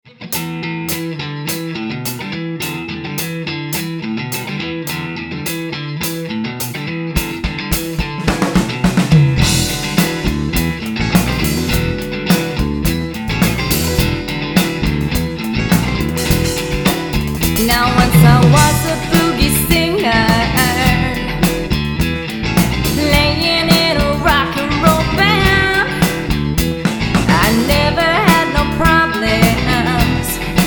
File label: Funky Music